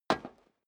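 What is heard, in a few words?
step boots single metal dry single stair down, footsteps; schritte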